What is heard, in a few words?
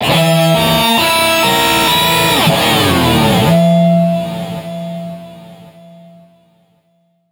Building Hover